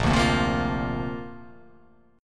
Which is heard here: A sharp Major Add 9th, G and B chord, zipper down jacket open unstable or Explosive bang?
A sharp Major Add 9th